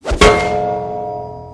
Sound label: frying pan; Tangled